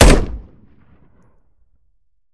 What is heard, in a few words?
weap fire; gmod; viper; weapons; scharlie